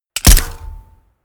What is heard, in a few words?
gun shot silencer designed mechanic